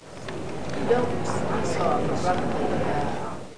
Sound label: Ambient